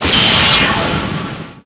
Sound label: Battle